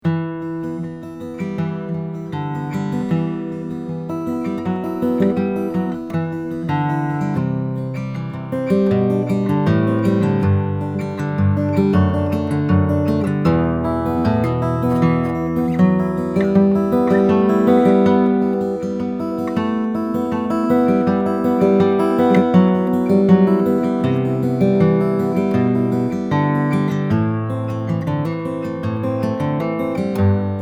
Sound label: Fingerpicking; Neumann KM253